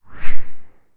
Woosh Metal Tea Strainer Slowed Down

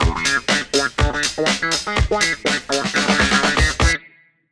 Groovy Winner; End Version

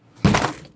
Crunch

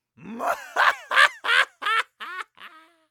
Mad Man Laughter Dry

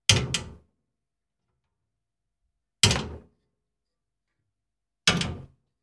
Big Metal Gentle Clings

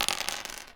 Clatter

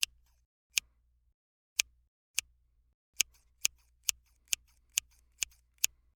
Scissors

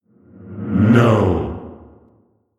No Reverb

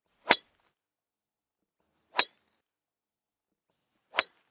Golf Driver Shots

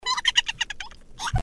Tickled Chipmunk

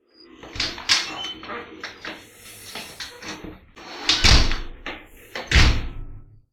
Back Doors Open And Close

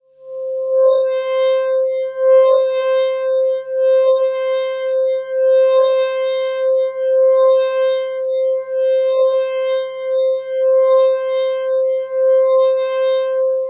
Cristal Pad

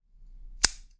Switchblade

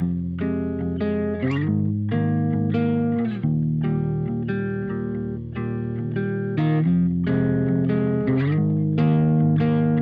Electronic Pop Guitar, 140bpm, G Sharp Major

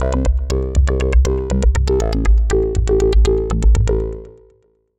Acid arp 120bpm

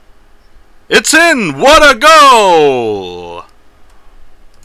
Goal

